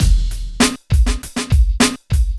drloop, drumloops, Vinyl Attack; Masterbits

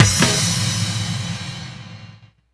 drums, Hardcore Breakbeat, 152; Big Fish Audio; Hardcore Breakbeat Action; Robot Live; Abmaj

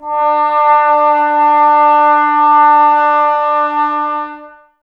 brass, Tbs Mute

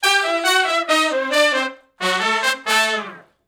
R&B Riff (A) unison, Horncraft; Sony Creative Software; Crimson Blue and Fabulous Horncraft 4 RnB; Horncraft for R&B; Sections

